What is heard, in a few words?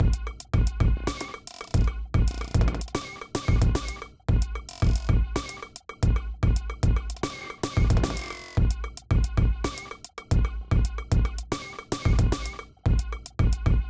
funk hop, grooves